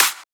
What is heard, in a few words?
clap snare; Anthemic